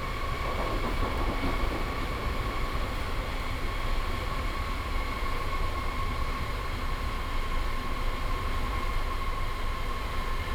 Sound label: U Bahn Berlin; Sound Scapes